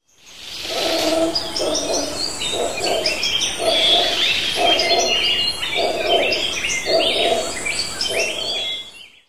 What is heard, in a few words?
monkeys in jungle sounds; primate